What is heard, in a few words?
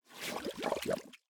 flame trail; Smite; Sol